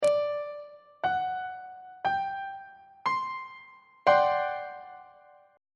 f double sharp major 7th suspended 4th 2nd inversion on treble clef